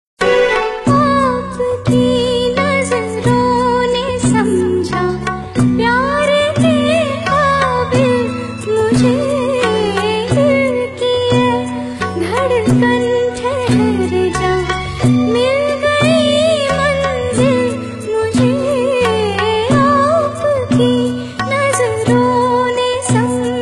Female Version Ringtone

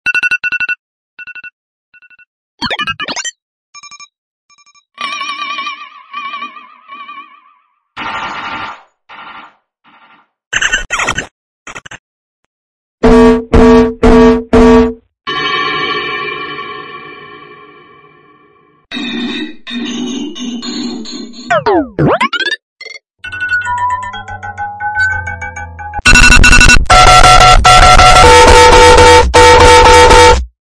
Iphone 14 Alarm Ringtone; ringtones

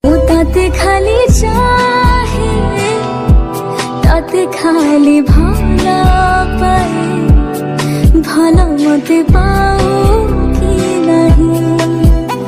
Odia Love Song Ringtone

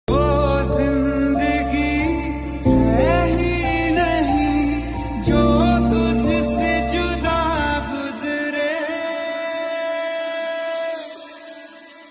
Sad Mood Off Ringtone

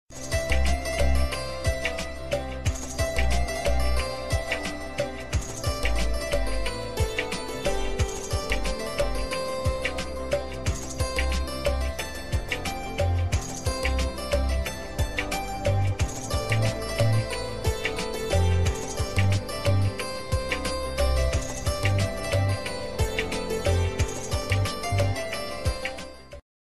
Ringtone Instrumental Piano

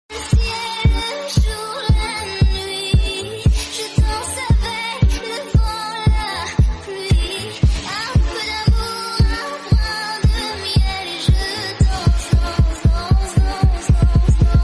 Dj Remix; ringtones

